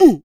FEM PERC, Vocal Jazz FX

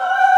3D Voicy; E-MU Producer Series Vol. 5 – 3-D Audio Collection; 3DSprints

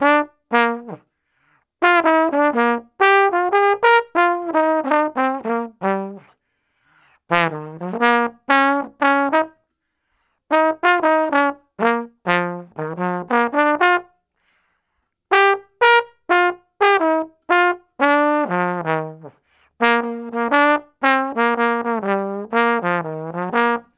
Trombone Solo, Jazz; Sonic Foundry (Sony Creative Software); Jazz Solos and Sections; Traditional

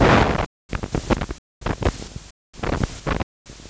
Rythmic Noise 130BPM

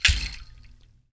HAND, Afro Shakers, Ankle Pods

